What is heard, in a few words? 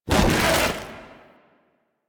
Dodge; Warframe; Broken Frame